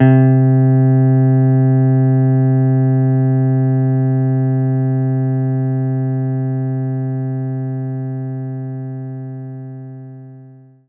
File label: Jazz Guitar C3; knights try